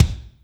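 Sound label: kick, Alesis D4; drum machine; 300 Drum Machines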